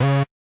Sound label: saw, programmable speaker